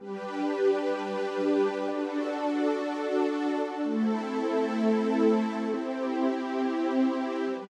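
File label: soft loop music tone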